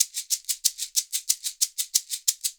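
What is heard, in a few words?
shaker low, percussion; ILIO; Synclavier Percussion 2; World & Orchestral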